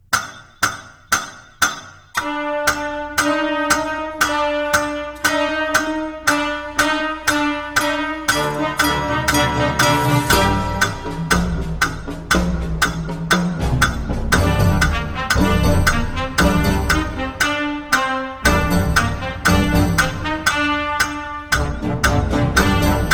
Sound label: Kick Start with clicks Slow